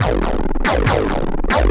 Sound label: Industrial 140